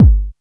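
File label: AMB bass drum; WORS STATION; AMBI BLEEP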